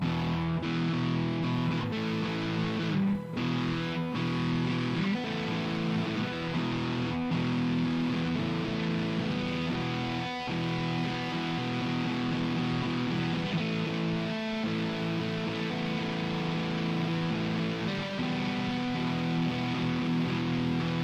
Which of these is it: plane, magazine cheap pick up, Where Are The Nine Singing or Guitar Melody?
Guitar Melody